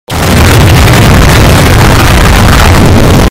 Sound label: explosion